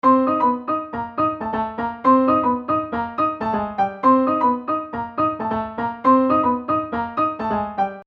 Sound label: key